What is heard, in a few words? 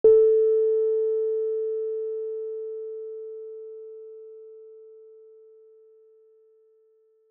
piano; lezing